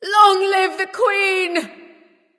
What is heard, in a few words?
death; smite; nox